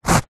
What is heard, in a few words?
paper tissue cutting with serated knife single slice; foley